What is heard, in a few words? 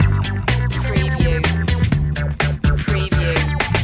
crazy kids loop no guitar